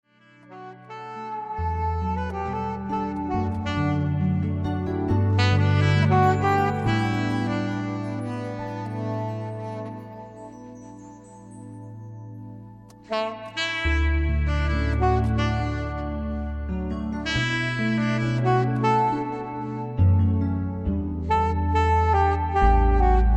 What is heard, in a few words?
sax; gospel